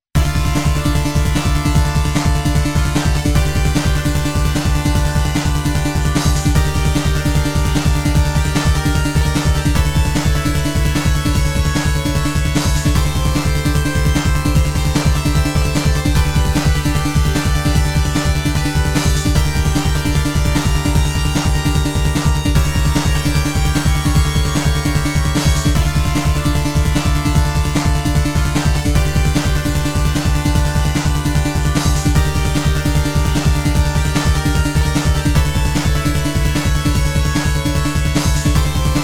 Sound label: last boss; WINGEDGEAR